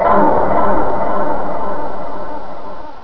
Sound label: End of Game; Bedlam